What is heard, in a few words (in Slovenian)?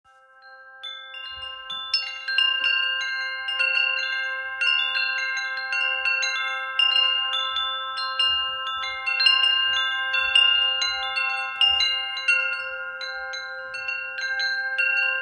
Vetrni zvon frekvenca 528Hz; ljubezen